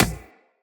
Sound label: Beach Ball Kick; Water Fight